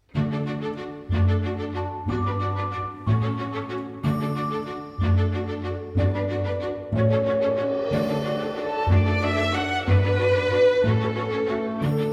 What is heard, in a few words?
Summer Morning, Live orchestra; EMI